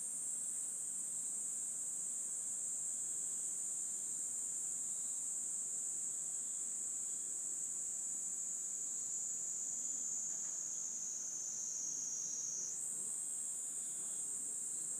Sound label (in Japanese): ニイニイゼミ